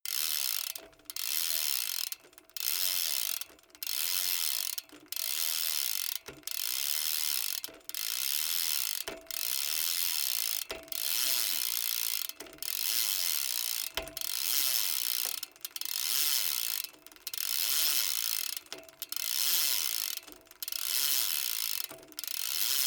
bmx bike crank freewheel slow long sequence

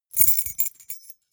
key ring small rattle air hard

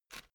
headphone extend single short

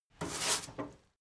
mechanic sink short brush

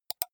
computer mouse left click single fast

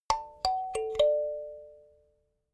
notification kalimba sequence major

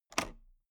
toolbox latch open loose

